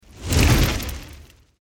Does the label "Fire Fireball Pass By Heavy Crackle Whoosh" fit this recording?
Yes